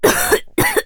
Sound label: COUGH FEMALE; combatarms